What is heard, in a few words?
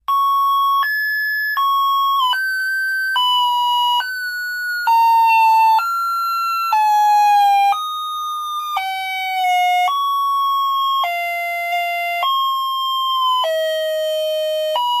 Alarm Car Type 4, car alarms